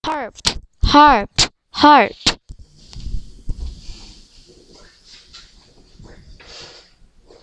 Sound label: harp